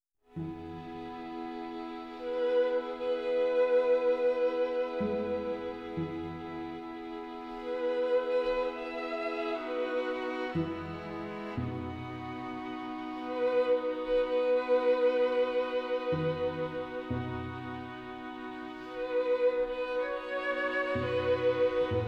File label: String Quartet in C Major 2nd Mov; Classical Ambience